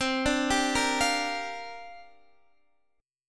C 7th Suspended 2nd Suspended 4th C down Guitar DADGAD